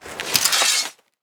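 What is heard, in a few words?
blade one hand draw, equip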